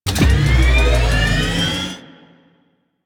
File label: Weapon Three Charge; Modular Weapons